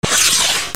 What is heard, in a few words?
FRICTION